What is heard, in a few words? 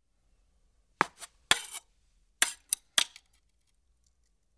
Ice Skates Clacking; ice skating